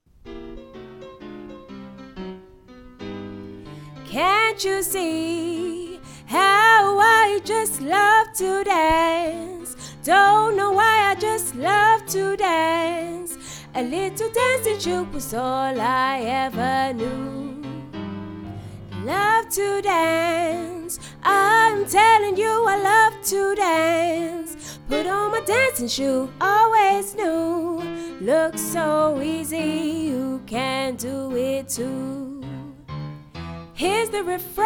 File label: backing track